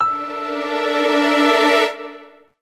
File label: Reward Court Mono